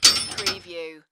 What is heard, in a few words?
Chain Metal Clanging